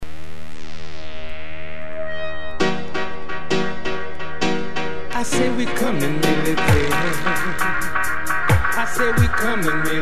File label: roaring lion dub